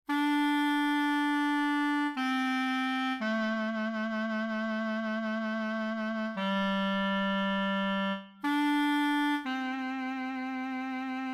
clarinet

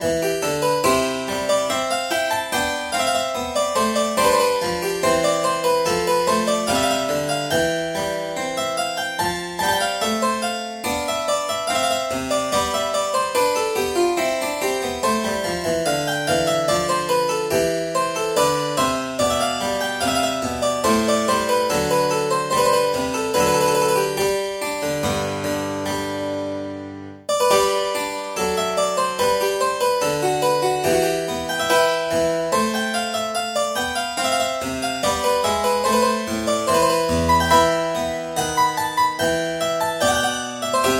french harpsichord

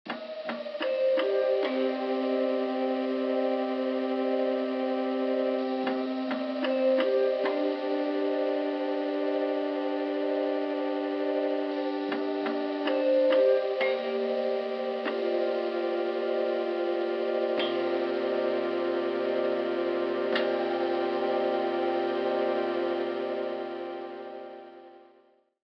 musical typewriter

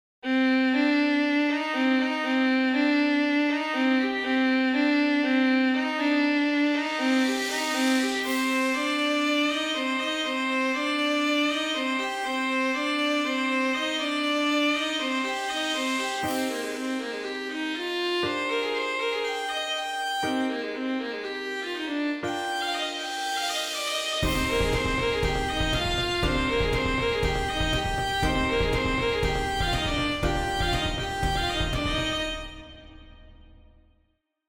captains strings violin

